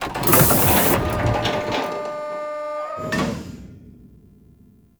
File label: Mover Hands; Con Junction; Warframe; Entrati; Ambience